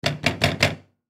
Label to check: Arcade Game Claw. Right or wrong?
Right